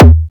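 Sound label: Synth Bass Drum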